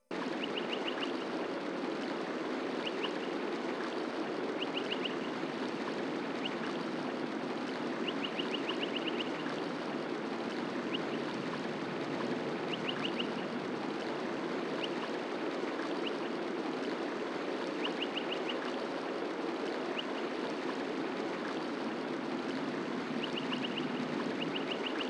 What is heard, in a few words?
Ambience